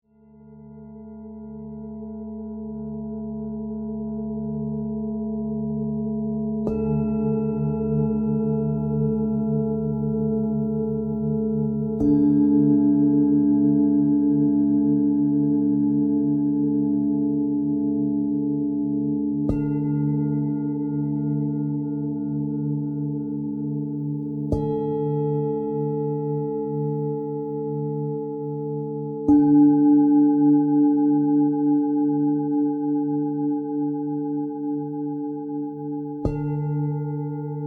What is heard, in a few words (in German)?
klangschalen stirnchakra saturnton; klangschalen chakra meditation